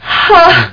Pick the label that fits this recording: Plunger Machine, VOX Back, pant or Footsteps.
pant